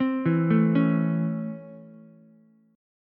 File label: C sharp Minor Major 7th Sharp 5th C sharp Ukulele; C#mM7#5 arpeggiated; JGuitar